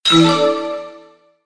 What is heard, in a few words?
word success relax; typing; tf2ware ultimate